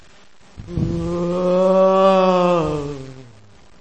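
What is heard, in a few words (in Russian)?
жалоба затяжной стон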